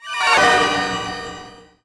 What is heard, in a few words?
already used